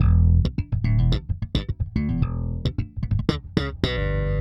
Slap, Bass; Bass X

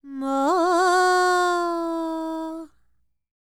World Voices, INDIA; ILIO; Vocal Planet

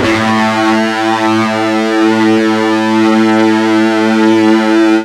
FUZZ A 1, ULTRA FUZZ, Guitar; East West; Steve Stevens Guitar Samples Collection

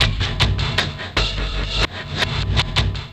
Elektro Kik Cym Back, Drums; Sonic Foundry (Sony Creative Software); Friendly Fire

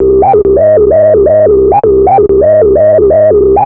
Analoop, Industrial Analog

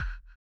SONAR L; Spectrasonics; Metamorphosis

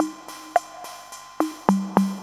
Boom Boom, Breaks; Zero-G; Beneath The Planet of The Breaks

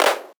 Clap, 707; classic machines; 707mod

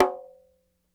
Petia Med, World Percussion One Shots; Sony CS

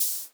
M500 HH, Drums; Best Service; Dance Mega Drums; MAESTRO

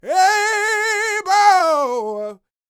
Vocal Gospel; Vocal Planet Vol1 Gospel

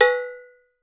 SALSA, COWBELLS; Voice Crystal - Steve Reid Definitive Percussion

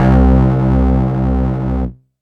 Remzy Bass, Bass One-Shots, Drum n Bass; KJ Sawka - Drum n Bass & Beyond

